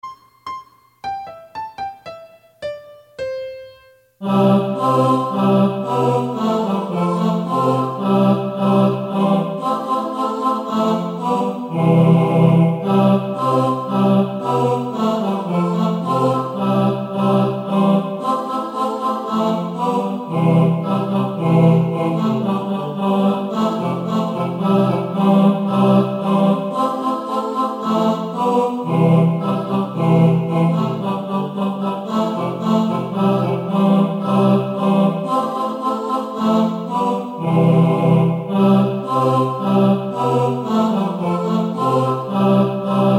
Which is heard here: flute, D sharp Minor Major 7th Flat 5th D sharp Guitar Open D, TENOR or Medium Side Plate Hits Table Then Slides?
TENOR